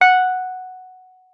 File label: WURLITZER F#5; Xsample VOL-1; WURLITZER RS